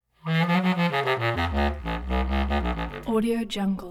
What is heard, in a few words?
contrabass clarinet short low range phrases